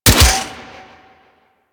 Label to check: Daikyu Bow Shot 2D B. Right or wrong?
Right